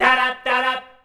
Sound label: "darab" C, MALES; Best Service; Hyper Dance